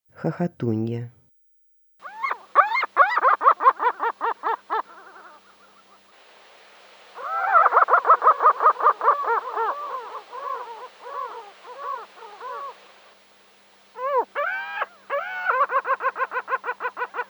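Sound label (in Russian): hohotunya golos, Caspian gull voice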